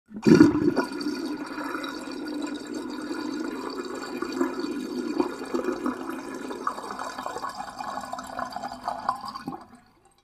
water drain

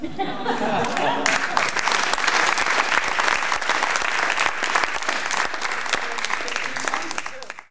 applause

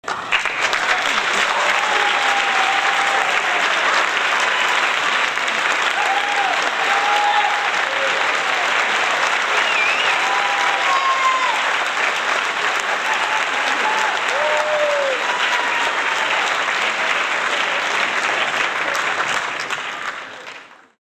applause, people sound effects

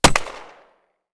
gunshot

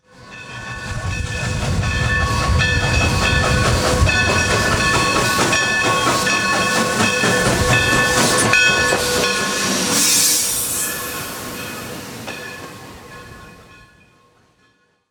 locomotive pass by

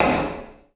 clong, mechanical sound effects

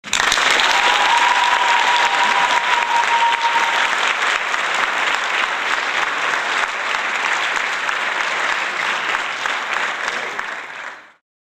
applause

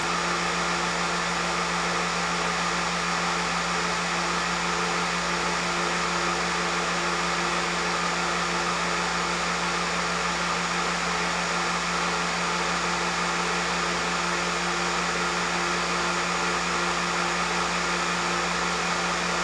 machine

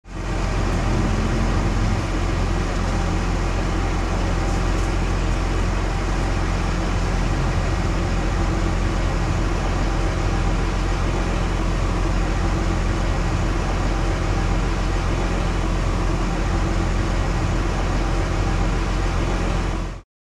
truck idle

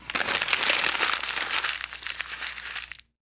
paper crumbling

